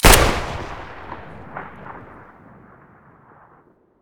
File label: saiga muzzle stereo, weapons